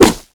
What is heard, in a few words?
wooden hit high armor low damage; Mount&Blade Warband